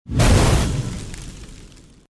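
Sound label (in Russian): короткая вспышка пламени; Звук огня; Звук огня для монтажа перехода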